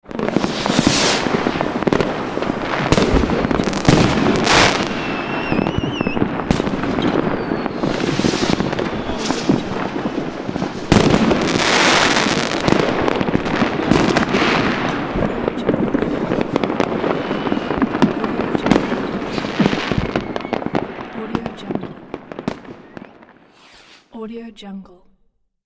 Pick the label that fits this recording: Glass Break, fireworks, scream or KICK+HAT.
fireworks